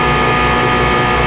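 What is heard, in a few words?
elevator move; tnpdemo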